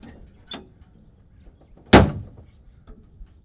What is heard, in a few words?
Ford Stake Bed Truck Door Open Close; Sony Pictures Sound Effects Series; Period Vehicles